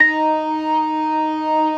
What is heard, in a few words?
organ synth; InVision Interactive; Keith Emerson; The Most Dangerous Synth and Organ